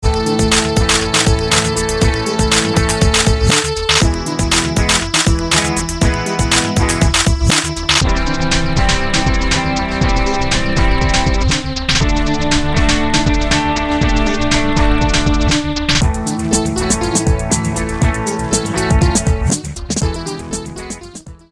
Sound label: moog boog; marquidrevived